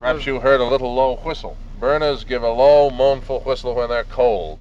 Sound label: Automobile; Tony Schwartz